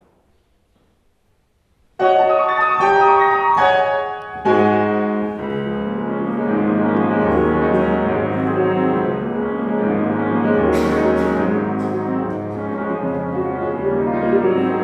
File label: piano; GRANADOS